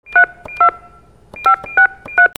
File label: ALARMS